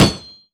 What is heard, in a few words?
frag physics bounce wood hard; viper